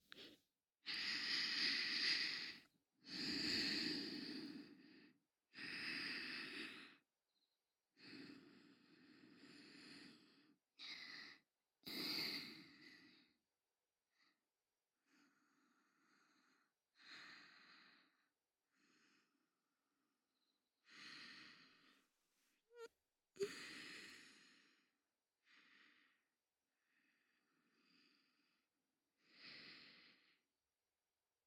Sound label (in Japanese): 寝落ち用 (寝息、寝返り音)